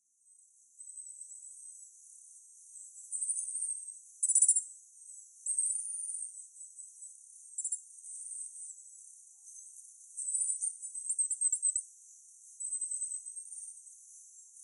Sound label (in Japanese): hirara; Utsunomiya